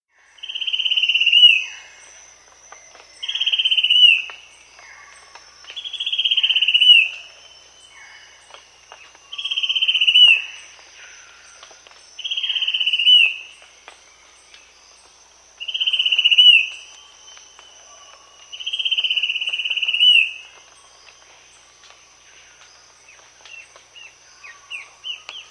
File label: Spot-Winged Antshrike; S. America